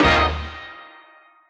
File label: solo, stabs